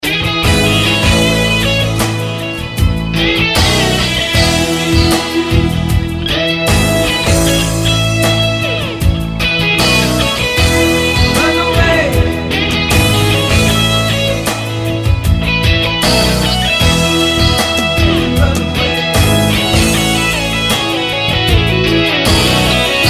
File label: strat